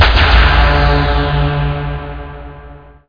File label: jump; nihilist